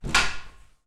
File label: Door open